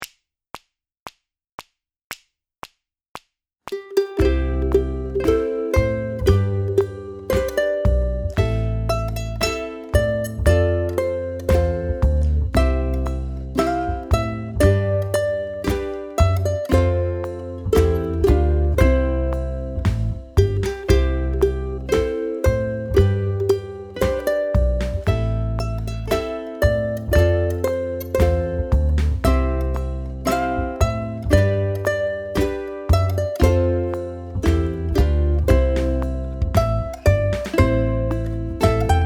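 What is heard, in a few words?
BACKING, ukulele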